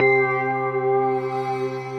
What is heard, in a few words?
Morning Bells, Majestic Mallets; Ableton; Mood Reel